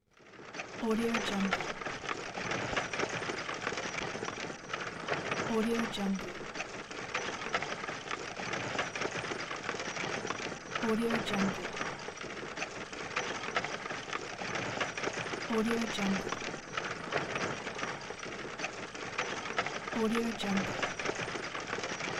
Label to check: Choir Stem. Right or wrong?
Wrong